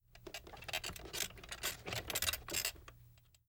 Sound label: Stroller Cart; Fairlight Sound Lib